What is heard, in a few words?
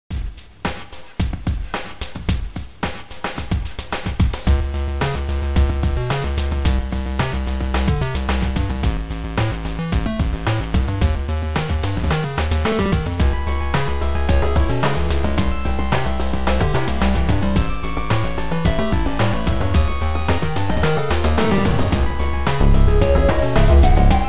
8-Bit; Pareidolia; Call of Duty Aether Soundtrack